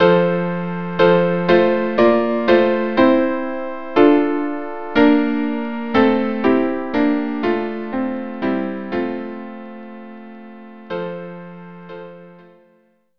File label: trio